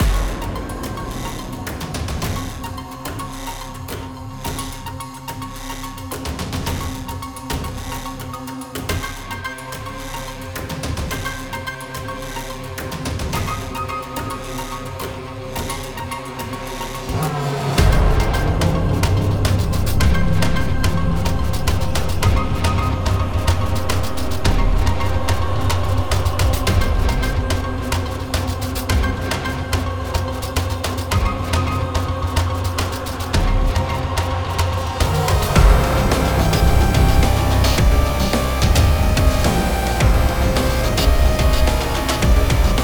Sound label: Battle Normal Louder; Pinku Kult Hex Mortis